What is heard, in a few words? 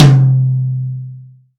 acoustic tom one shot c key